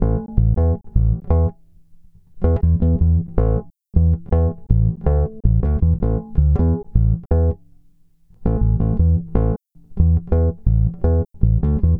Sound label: Electric Bass